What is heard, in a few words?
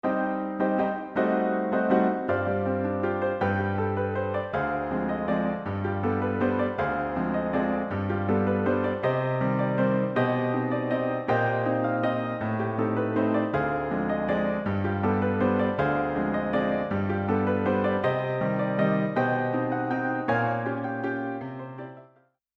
waltz